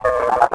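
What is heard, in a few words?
droid sentry hit; Star Wars Knights Of The Old Republic II; XBOX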